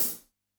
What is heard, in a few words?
HHCL, Yamaha DD-65, HH